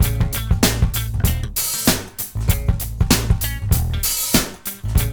MIX, Funky Ass Loops; East West

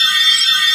METAL NOISE; Techno Trance; E-MU Formula 4000 Series Vol. 2; Effectors

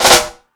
FX Sounds, Drum Machines E-MU Pro-Cussion

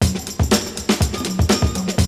Drumloop; Drumloops; ZERO G - Datafile DISC 3

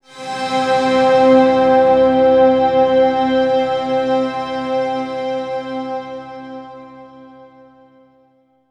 NRG Pad

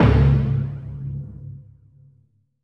TIMPANI, PERCUSSION; AKAI S6000 CD-ROM - Volume 5

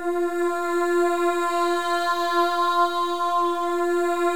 MOVING V3, Symphony of Voices; Spectrasonics

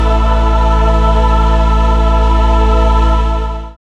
SYN DANCE, Roland; Sound & Vision - Gigapack II

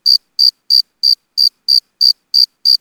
Nature, Crikets; X-Static Goldmine

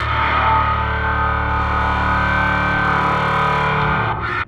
Electric Bass; Sonic Foundry (Sony Creative Software); Knutrix Drum & Bass

